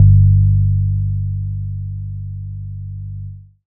long bass live; Jungle

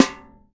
LD Snare; Snares

